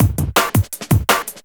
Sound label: System Break, Breaks, Jungle; Blu Mar Ten - Jungle Jungle - 1989 to 1999 Samplepack